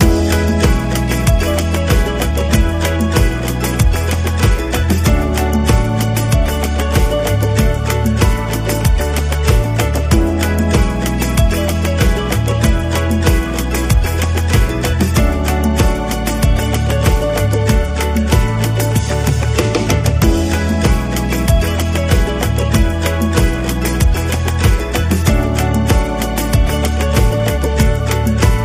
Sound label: Ukulele Lounge